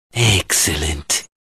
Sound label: External speaker SMS ringtone; زنگ اس ام اس سخنگو خارجی